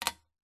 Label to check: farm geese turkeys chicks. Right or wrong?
Wrong